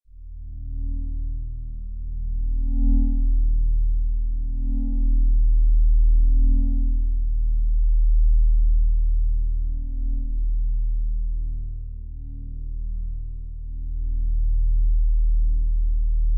Drone, Drone, Very Deep, Mechanical, Throbbing, Pulsing; Deep Tone